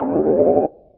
warning Lane; Space Wave Race

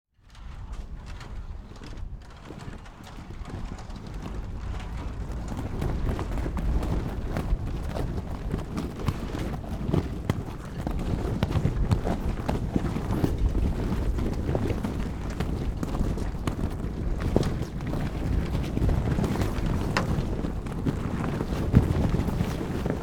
Boat - Hoisting Sail; KMP Film Video Sound Effects

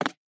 Peg Leg Footstep; Pitch Black - A Detective's Demise

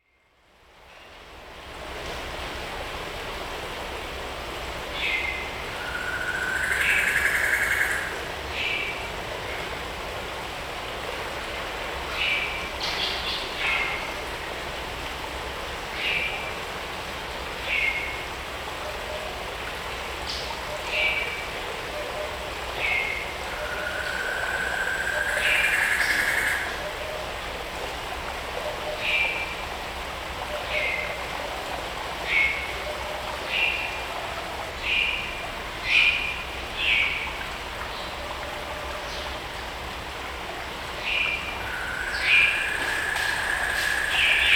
Walking Machinery Trucks

